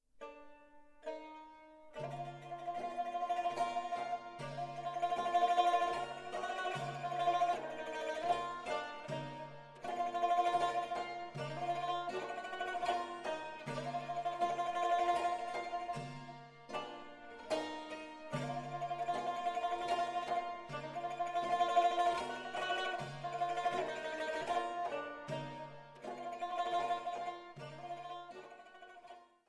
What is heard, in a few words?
Trinity Instrumental